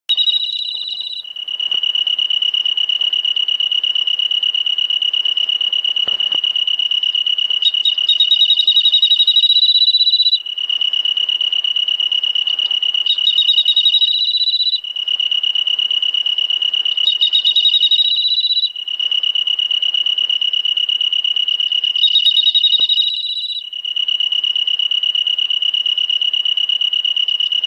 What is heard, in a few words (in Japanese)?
ryuukyuu kantan